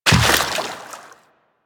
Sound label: Step Water; DE; Warframe; Tenno